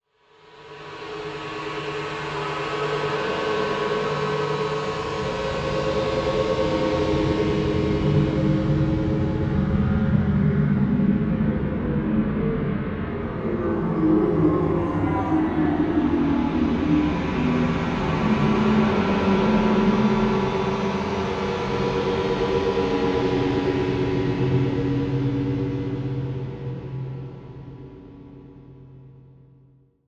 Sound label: Electronic Whoosh Calm; Module Production Element Title Imaging